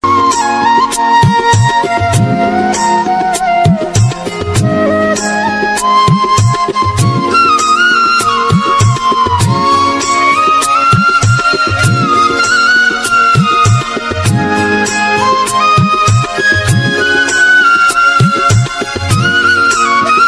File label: music ringtone, bollywood